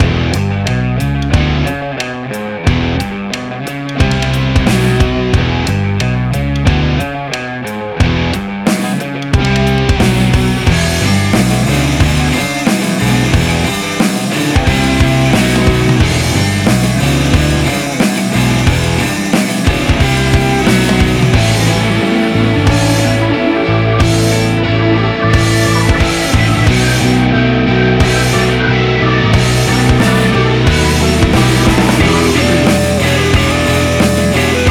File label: BG Music